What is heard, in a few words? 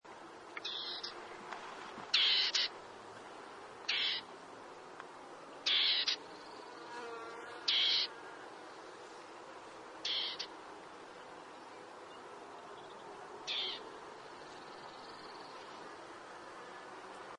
dartford warbler